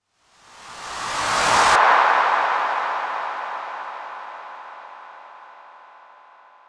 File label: Agressive Raiser